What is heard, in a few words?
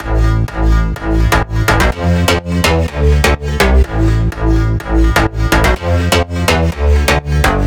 Big EDM Bass Loop A1; Future House; Future House Tools; Bass Loops